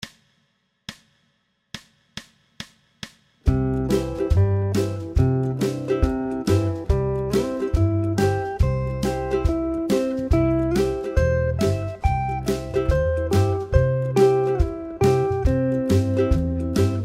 uke pop c only

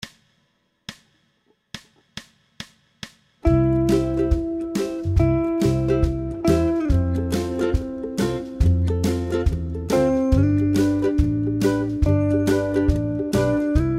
single string

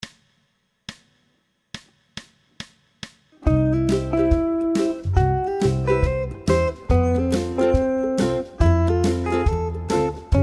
leading tones

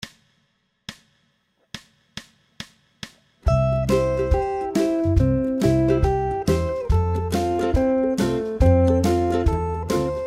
four string with octave, guitar

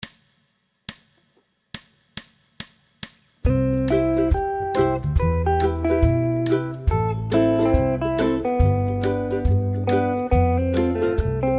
uke caged solo

